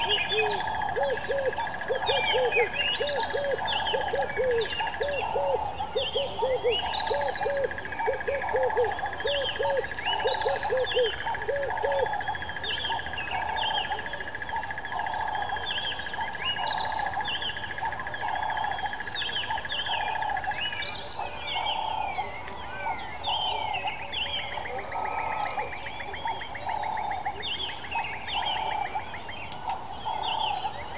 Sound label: mountain birds sing at dawn; spioenkop; African warbler bird